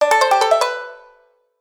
save

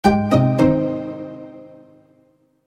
sound logo

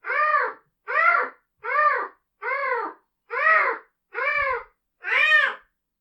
cat

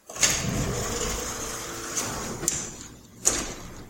auto door

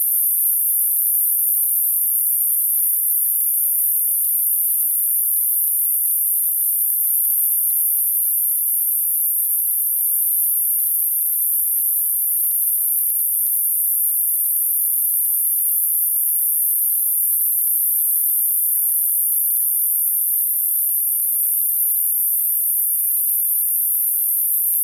haoto

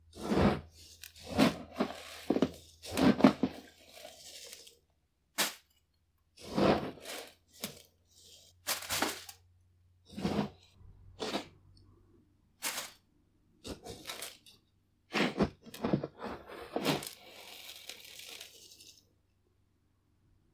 cat toilet

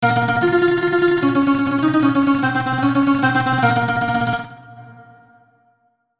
game over

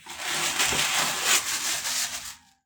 paper towel

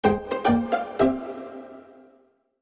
sound logo

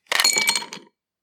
grass in ice

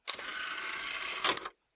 cd tray close

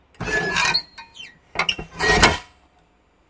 paper cut

